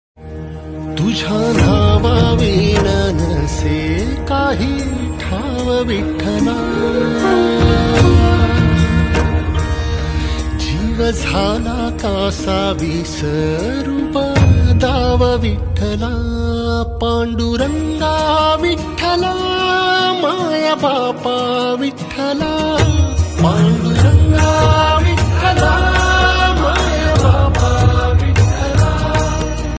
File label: marathi ringtone, marathi pandurang vitthal bgm ringtone